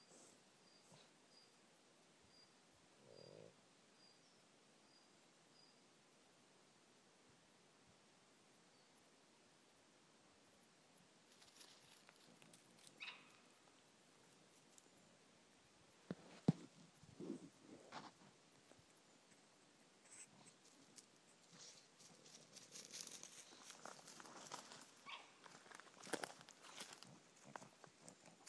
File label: monkey sounds on sasamekura mountain nikko japan